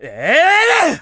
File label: Ending Blow; Link's Ending Blow (downward thrust)